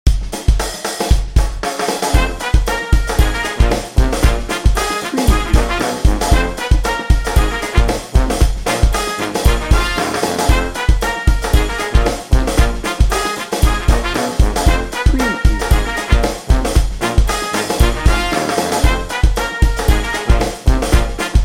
mardi gras party no horn solos

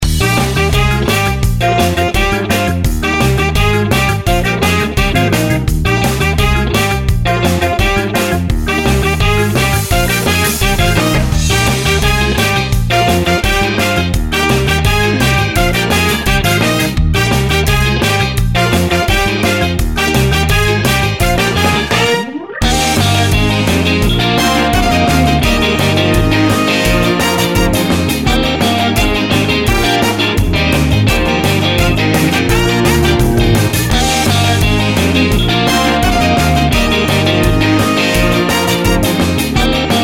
ska party

